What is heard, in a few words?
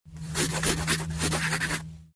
bg pencil